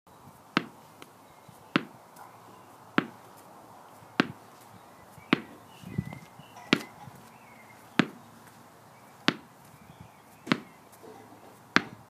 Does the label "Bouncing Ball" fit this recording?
Yes